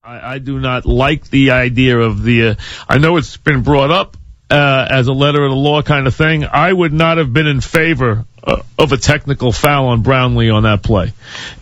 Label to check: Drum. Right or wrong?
Wrong